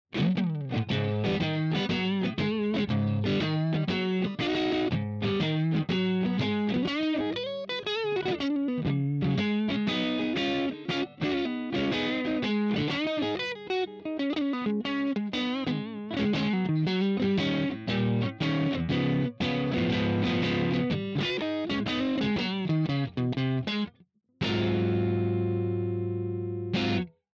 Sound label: dirt, guitar